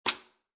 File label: Pill Bottle Large Place Down, Foley, Pill Bottle, Large Plastic Bottle, Placed Down On Counter Top; Set Down